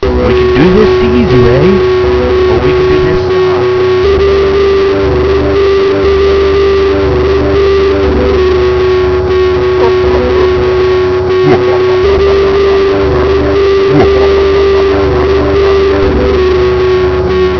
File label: interrogation